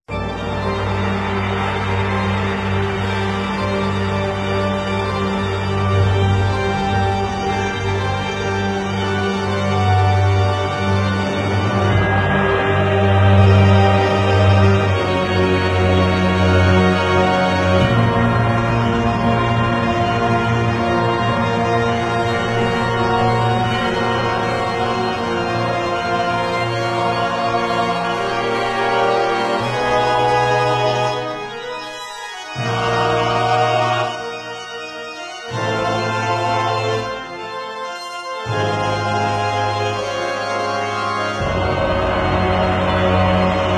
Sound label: organ; identityv newbgm